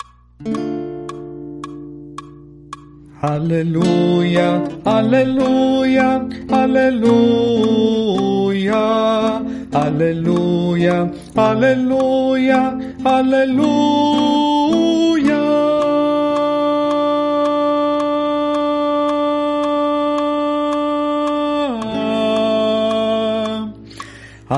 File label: Aleluia tenor